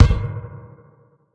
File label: Menu Basketball; Menu FX